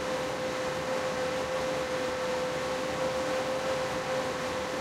comet engine int rpm 99, tanks; War Thunder

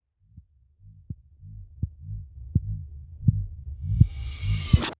adrenalin off; World of Tanks Blitz; GUI battle streamed

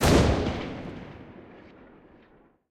mg 8mm sgmt lastshot; guns; tanks; War Thunder

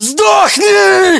melee attack; ModernWarfare; localized english

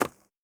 stag footstep concrete hard; Rust

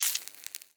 light gas spark; Escape from Tarkov

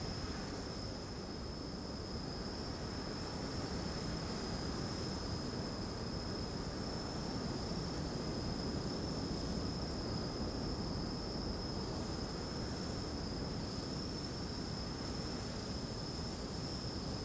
ambience field night breeze dry; Rust

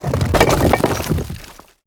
objects, World of Tanks

